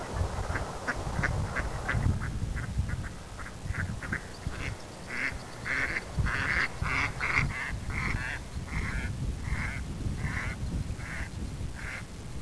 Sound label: mallard